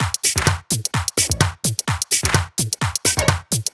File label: Drumloops 128BPM